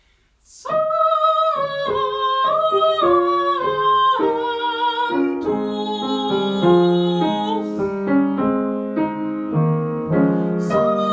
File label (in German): Croce Sanctus Sopran